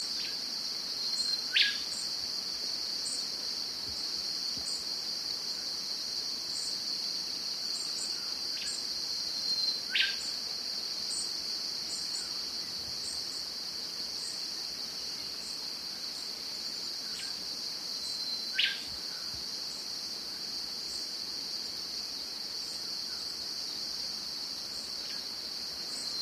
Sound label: tricoloured parrotfinch